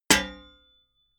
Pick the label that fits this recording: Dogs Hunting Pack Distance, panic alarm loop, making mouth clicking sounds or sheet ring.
sheet ring